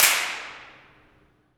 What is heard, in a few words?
impulse